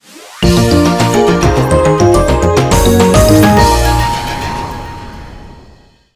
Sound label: Jingle Win; UNO DSiWare